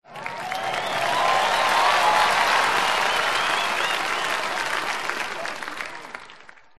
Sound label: cheers; c-evo